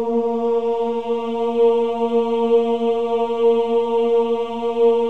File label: CHOIR OOS A#3; Spectrasonics; Symphony of Voices